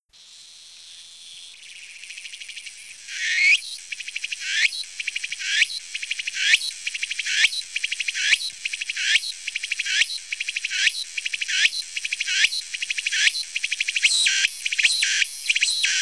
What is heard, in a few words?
Target Cicada